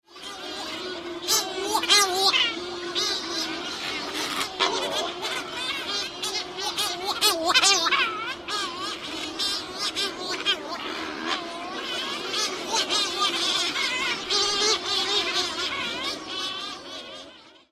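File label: male and female calls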